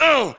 uh

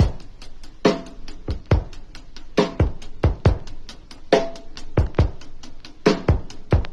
Drum loops, Boombap

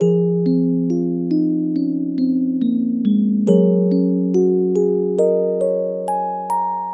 keys mused box, sad loops

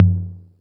eight oh eight, Bongo Congo, Misc Percussion

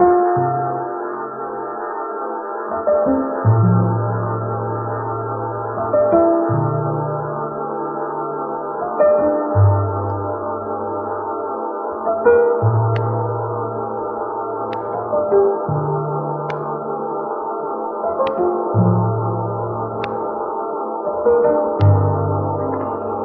piano unfolding mystery, Sad; Loops

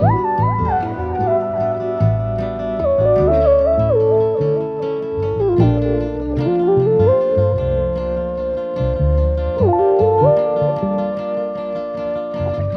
keys and guitar, Phonky loops

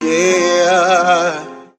"yeah", sung

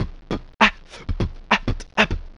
crackles, beatbox; Drum loops

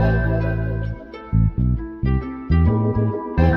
keys and voice hehey, loops, phonky

